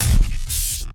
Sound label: Sci-Fi Weapons-Source Sounds-Futuristic Sci-Fi Shot; Robobeat